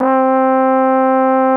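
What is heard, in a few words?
trombone; Greytsounds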